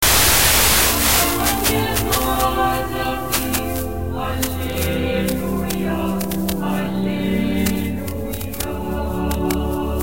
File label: 70.67MHz